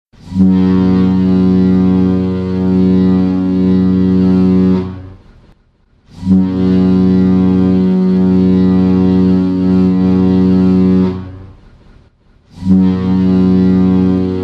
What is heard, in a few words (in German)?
Klingelton